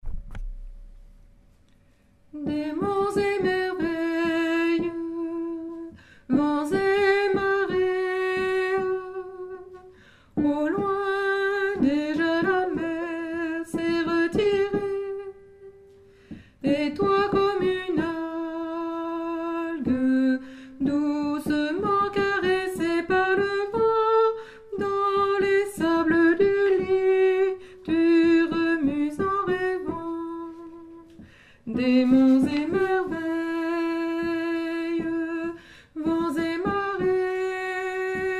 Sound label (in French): alto, chorale